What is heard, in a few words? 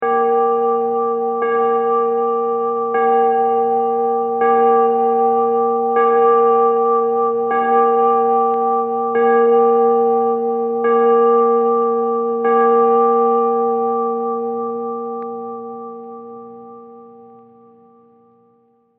Church Bell Chiming Continuous; Musical